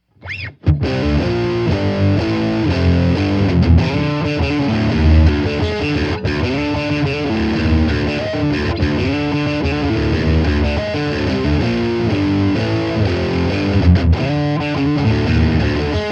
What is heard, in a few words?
stormchaser; EQ flat